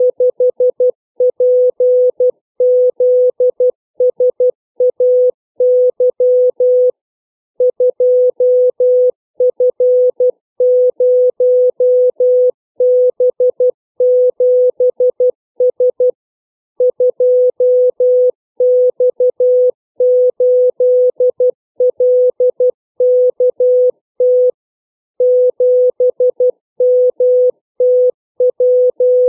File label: letter number groups, CW